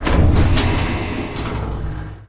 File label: ladder player lower; scripted sequences; distillery